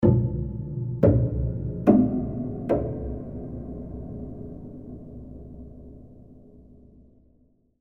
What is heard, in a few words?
hammer